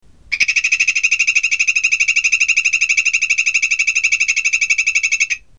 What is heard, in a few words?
Sound Phyllobates Bicolor; Dendrobatidae